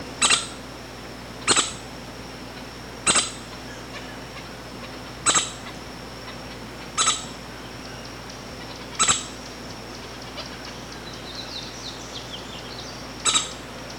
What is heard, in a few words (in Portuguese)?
canto do pica pau de topete vermelho